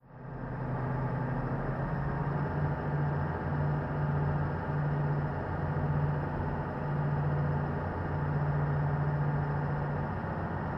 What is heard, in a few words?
Military Pbr: Onboard Idle And Accelerate To Fast, Steady Cruise. Dual